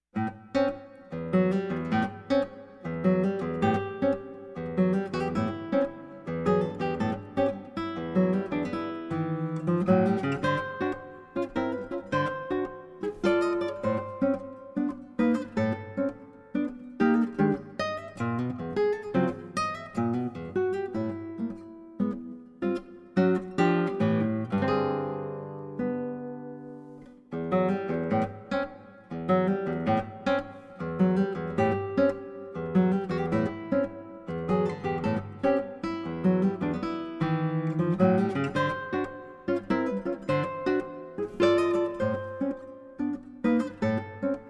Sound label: Blues and Seven Variations